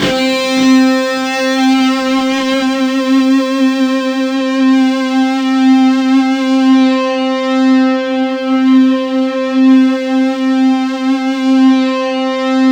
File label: C3, Synth; 90 s Sample CDs; NiceBeats; SynthLine VOL-15